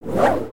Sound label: Torch Spin; build mode